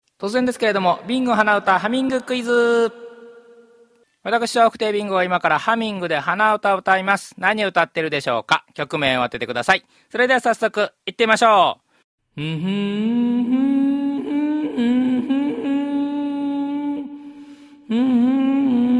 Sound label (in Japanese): hanauta